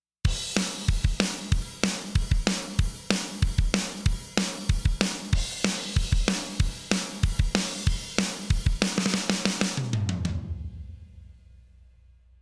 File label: drum fuse on